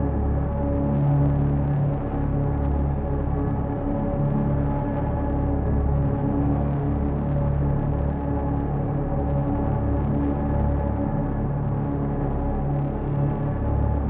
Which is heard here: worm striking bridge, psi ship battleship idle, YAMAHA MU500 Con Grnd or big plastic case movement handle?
psi ship battleship idle